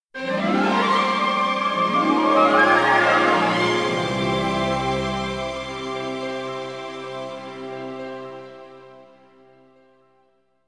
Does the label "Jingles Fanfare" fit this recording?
Yes